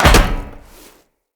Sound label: land heavy metal perforated, footsteps; Characters; Tenno